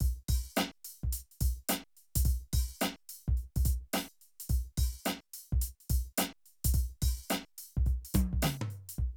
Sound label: Drum loops, Streetsoul